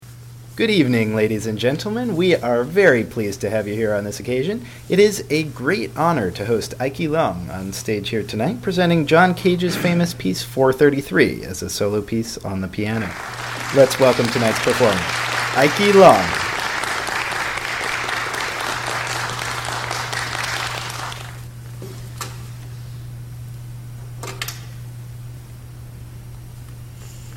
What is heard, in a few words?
White Noise; Third Coast Audio Festival’s annual ShortDoc